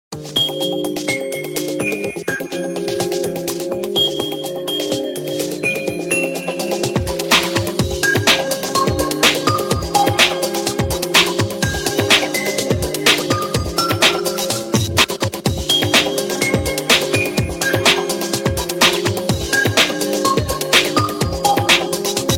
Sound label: Original Nokia N97